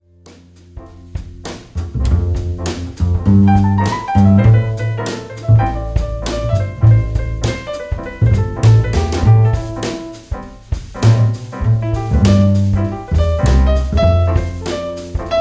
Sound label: Instrumental